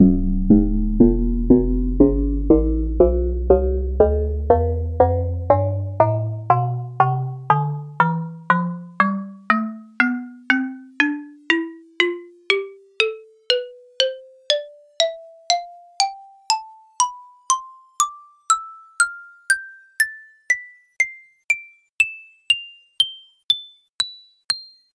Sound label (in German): xylophone tonleiter